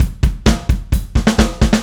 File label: Drums Fill, Indie Rock; Sonic Foundry (Sony Creative Software); Indie Rock Anthems; Session 10 - Roswell